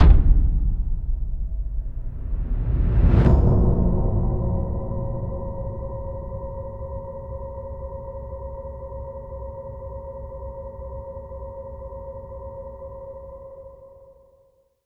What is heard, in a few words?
Impact Boom Thud; bottlerocket